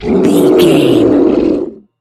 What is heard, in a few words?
troll monster battle groan